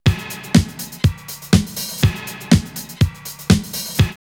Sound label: Disco, drumloops, OldSchool; X-Static Goldmine; 90 s Sample CDs